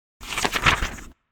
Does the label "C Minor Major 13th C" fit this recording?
No